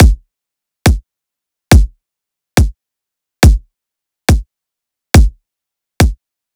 Riddim Drum Loop 140 Kick

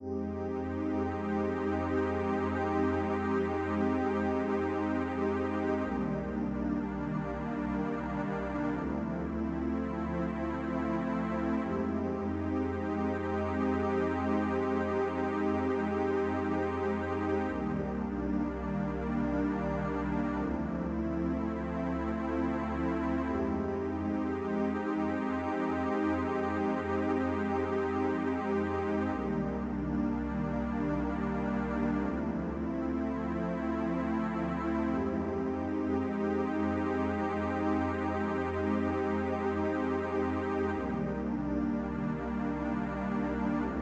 Chanced - 165 BPM C Min Pad, DnB; Melodies; Loop Stems

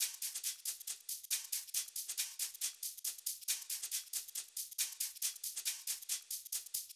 Percussion Loop 138 BPM; Brain

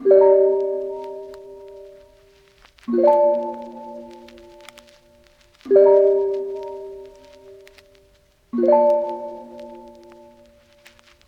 Lofi Melody Loop 85 BPM A# Maj Bells; Melodics; Loop Stems; Cymatics; Eternity

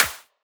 Clap, Psytrance

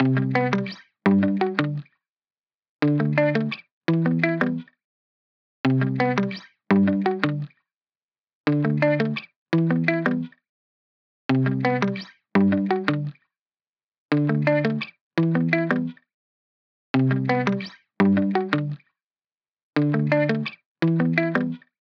170 BPM G Maj Main Guitar; Cymatics; Miami Guitar Pack - Pt 2; Patter

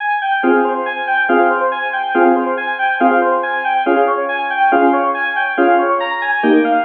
Riddim Melodic Loop F 140; Trinity; Ghosthack; Riddim Essentials Volume 2

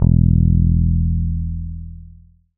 Bass Guitar, E; Basses; Cymatics; Eternity Sample Pack

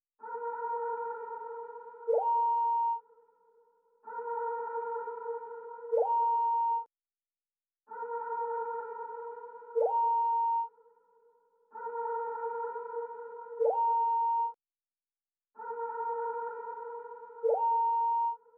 125 BPM A# Min FX; Melody; Trap; Loop Stems; Cymatics; 2022 Melody Collection - Trap Pt 2; Lament

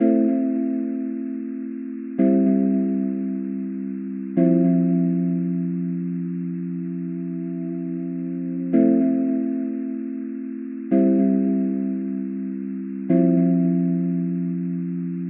Soft Chord Loop - 128 BPM A min; Synths; Chord Loops; Future Bass

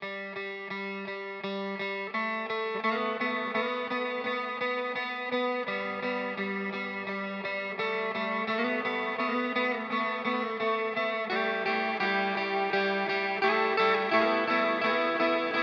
85 BPM C Min Hi Guitar; Loop Stems; Cymatics; Miami Guitar Pack - Pt 2; Rust

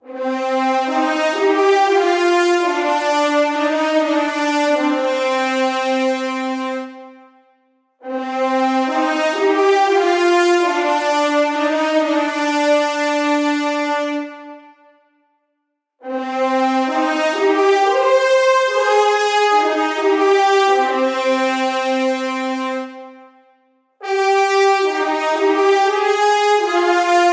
Horns - Cm 120Bpm; Construction Kits; STEMS; GhostHack; Ghosthack - SkyRealm; Kit 12 - Ravensthorn Manor

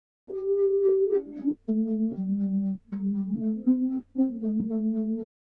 97 BPM E Min Low Vocal; Melodies; Loop Stems; Cymatics; 2022 Melody Mystery Expansion; Sleepless Night

